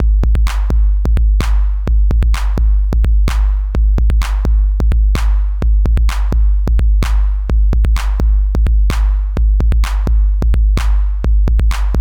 Chandler Limited Zener Bender Hardware 808 LIMIT SC 300Hz; Softube